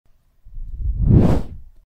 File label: Fast Whoosh Sound Effect